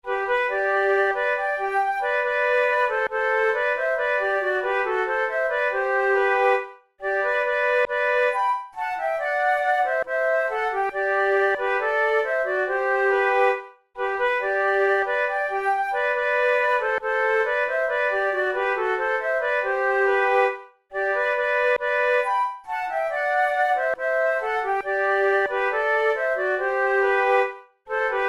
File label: duet, flute